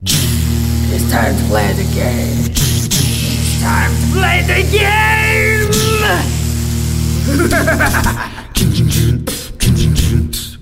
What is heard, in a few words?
anthem Acapella; WWE